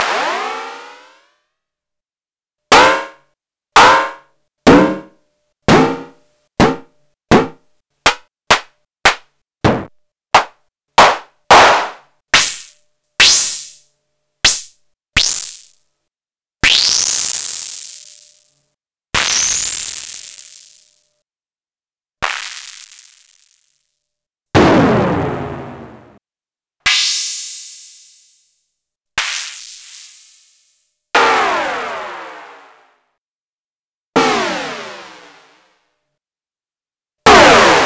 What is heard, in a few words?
Short Hats